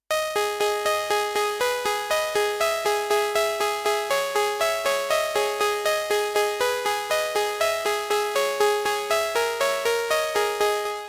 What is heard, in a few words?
rate reduced saw